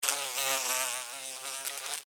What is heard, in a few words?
Fly Attack Buzz, Animal, Fly, Attack, Buzz, Wings, Insect, Bug; BlastWave